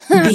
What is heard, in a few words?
voice female effort jump action